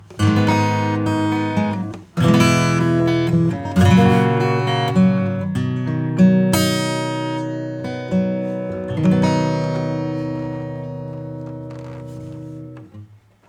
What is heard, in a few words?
acoustic Guitar Room; XenoVerb